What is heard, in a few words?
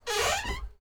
Wood Door Squeak